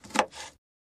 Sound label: Cutting, Slicing Carrot, Single Slice; utensils